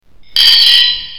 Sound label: saw; Cybuilder